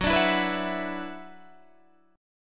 Gb 7th Suspended 4th Sharp 5th, Guitar, Half Step Down